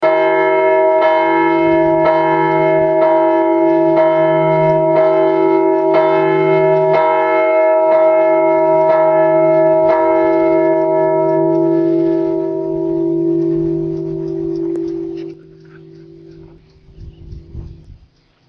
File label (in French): clocher tocsin